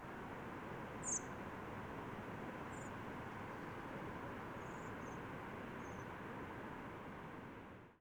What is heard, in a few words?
single diff shape